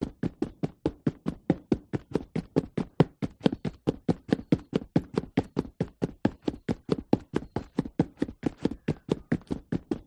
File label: foley footsteps running concrete boots; zapsplat